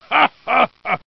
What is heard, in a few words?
Hahaha